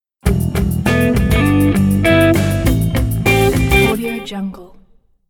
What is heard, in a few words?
scene transition rock; lokohighman